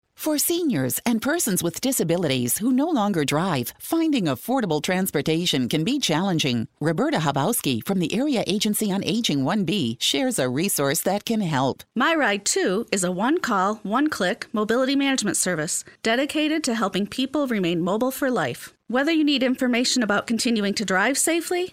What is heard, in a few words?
Choir